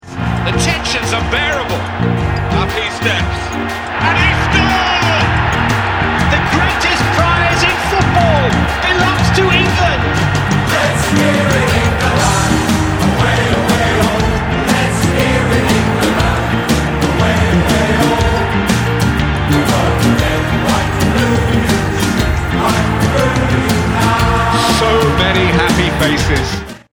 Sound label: Commentators World Cup Song